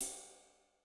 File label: Ride, Cymbal; Yamaha DTXpress-II